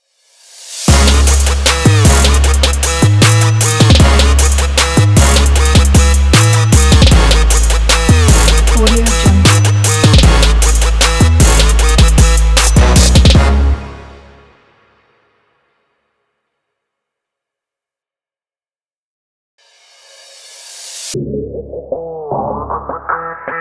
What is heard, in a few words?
hip hop trap sport logo